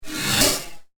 Another Door From The Future, science fiction sound effect; Andrew Fleming